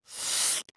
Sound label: vce pull